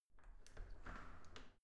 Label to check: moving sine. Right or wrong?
Wrong